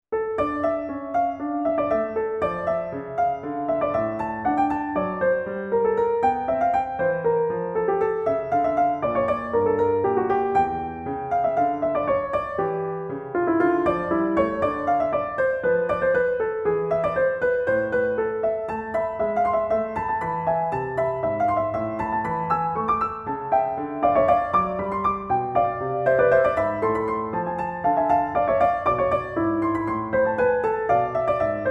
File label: Trio Sonata; Telemann; TWV42-d4; Essercizii Musici